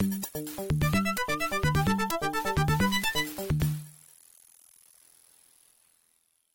Level Complete; Streets of Rage 2; Genesis

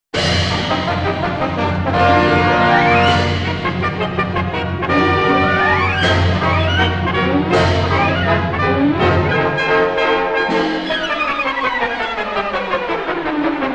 Drama Sting; Ren & Stimpy

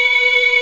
x charged; MMX4SFX

